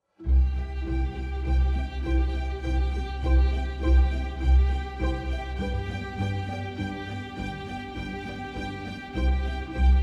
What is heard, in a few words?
piano